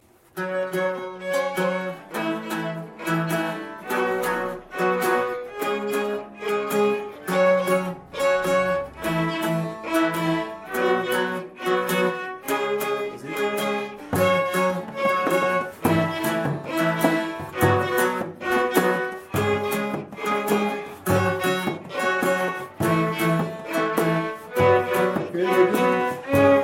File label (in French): rythmique